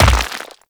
Brawler Rock Elemental Footstep Default; Warframe; Warframes

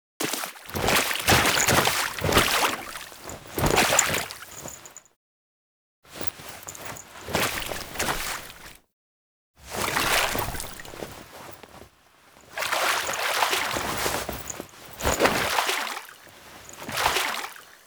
Foley Water; Cinematics; Archon Death; Drifter

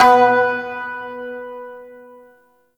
Shawzin Oct One B; Warframe

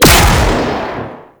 Auto Turret Shot; Venus

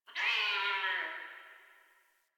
Distant Cat Screech; Warframe; Ambience; Nineteen Ninety Nine

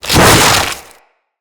Infested Ancient Tank Single Tentacle Swing; Emissary Ancient Tank

